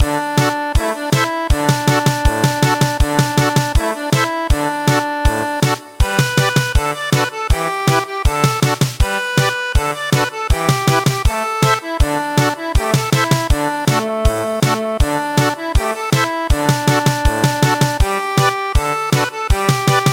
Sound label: madder color pass German Polka